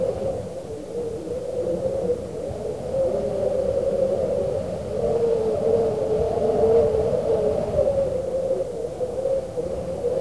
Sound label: wind in